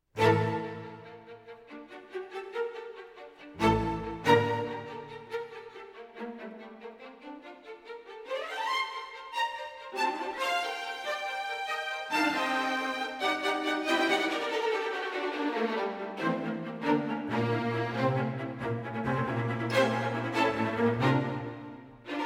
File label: allegro risoluto for strings